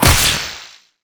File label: whip